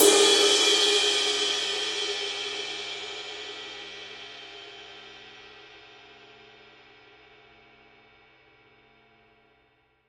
20 heavy ride edge, paiste 2002

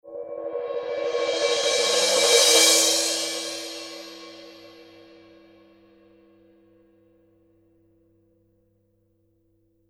16 purple heavy crash roll, paiste; color sound 900

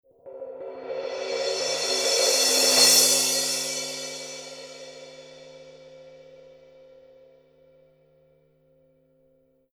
900 series 17 crash roll; paiste

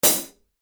2002 black 15 big beat hi-hat closed, paiste

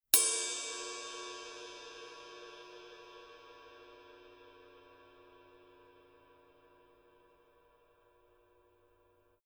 900 series 20 heavy ride body; paiste

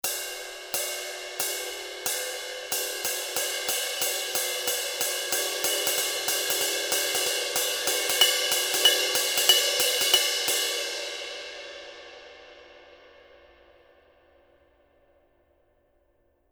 2002 black 19 big beat pattern, paiste

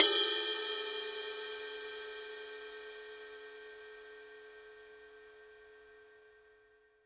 heavy ride bell; paiste 2002

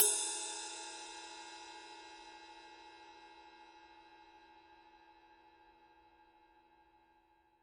20 heavy ride body, paiste 2002

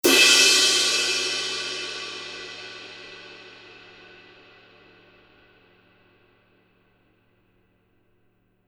2002 black 20 big beat edge, paiste

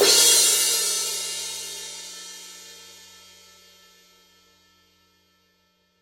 18 rock crash edge, paiste; pst 5

